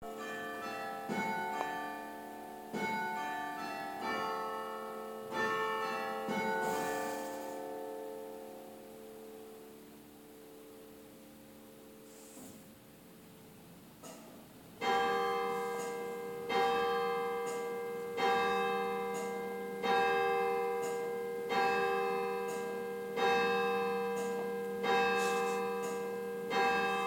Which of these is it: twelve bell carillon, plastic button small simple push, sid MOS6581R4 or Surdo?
twelve bell carillon